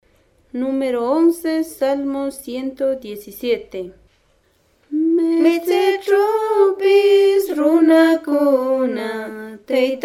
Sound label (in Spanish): cancion